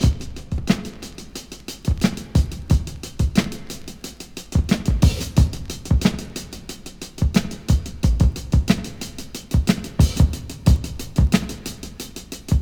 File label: 90 bpm breakbeat sample c sharp key